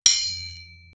blacksmith

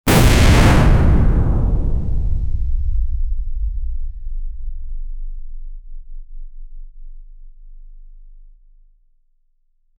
massive white matter cosm, cosmic

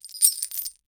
equip chainmail torso; armor

